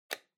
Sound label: Calculator Battery Cover Open